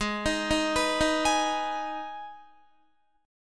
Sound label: G sharp Minor G sharp down Guitar, chord; G#m arpeggiated